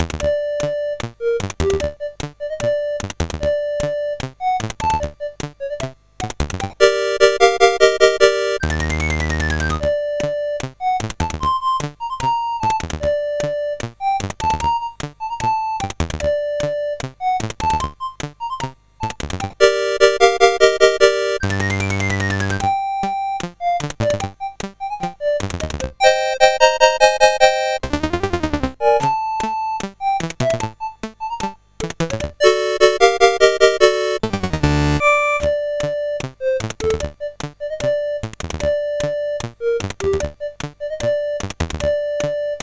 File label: Tango, CSG8580R5